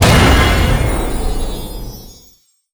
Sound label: Land Pendulum High